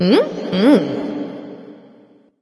disagree light; smite; voicepack; hel

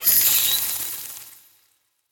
bats; smite; scylla

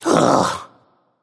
nv ugh; smite; voicepack; ganesha

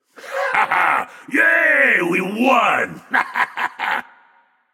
yay; smite; agni

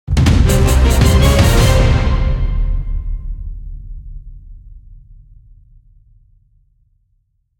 mus death; Smite; divine uprising

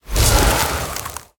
cast ice; smite; god merlin

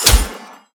hit; smite; medusa

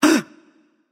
nv sigh; smite; xbalanque

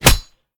hit; Smite; Tiamat

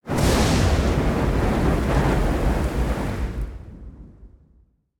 Recall Storm; Smite; Susano

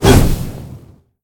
swing buff; smite; gilgamesh

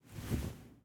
armor; smite; anhur skin13

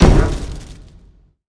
Hit; smite; kumbhakarna

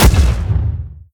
punch; smite; cabrakan

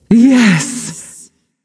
"yay"; smite; voicepack; nuwa

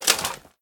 mvt armor arms; smite; god arthur

